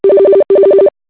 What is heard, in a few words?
Ring Tune